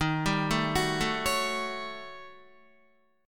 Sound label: Eb 7th Sharp 9th Flat 5th, Guitar; arpeggiated; 10,10,9,11,9,9; 4F,4A,5C,5G,5C,6E